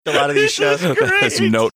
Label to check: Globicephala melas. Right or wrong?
Wrong